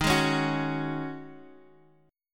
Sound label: Db Suspended 2nd Suspended 4th Eb, chord